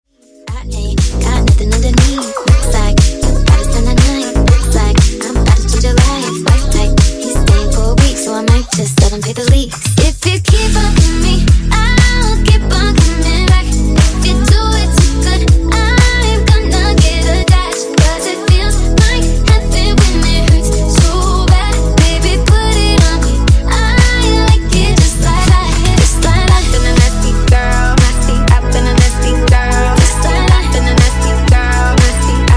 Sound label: Funk Housey 120 Bpm